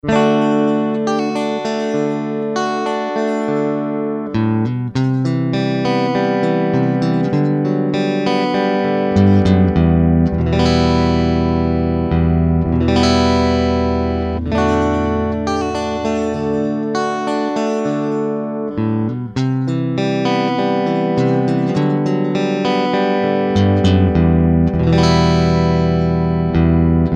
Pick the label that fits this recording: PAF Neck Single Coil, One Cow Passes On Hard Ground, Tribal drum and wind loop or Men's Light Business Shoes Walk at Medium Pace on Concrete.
PAF Neck Single Coil